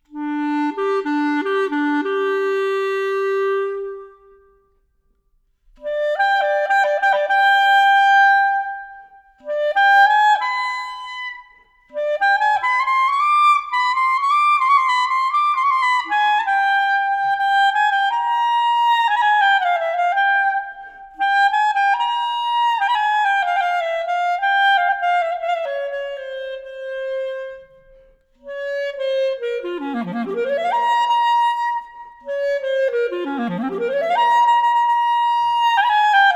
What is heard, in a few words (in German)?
Klarinette